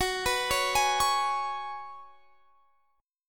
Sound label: Gb Suspended 2nd Suspended 4th, Guitar Standard; Gbsus2sus4 arpeggiated; x,21,21,18,21,21